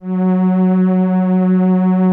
SOLINA, String Machines

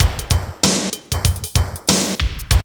break; Zero G - Man Machine

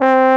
BARITON; Best Service; Alpine Volksmusik

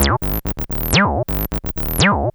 TB-120; X-Static Goldmine 1

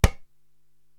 Baya Mute; Korg TR-Rack

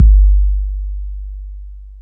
BD hip, Dance Mega Drums; Best Service

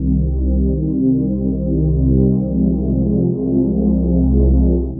Ocean Pad; Pads; Dr. Fink's Funk Factory

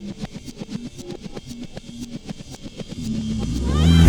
Backwards Beat, Trip-Hop Loops; Sonic Foundry; Rondo Brothers Trip-Hop Loops & Samples

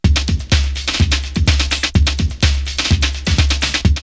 Jazzy 126, drumloops Techno House; X-Static Goldmine

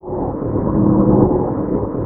GURBLE; ENVIRN-AMB

